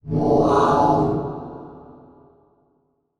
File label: Production Element Title Transition Demonic